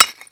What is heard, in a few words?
Pick Hit, Shatter Stone; NV3D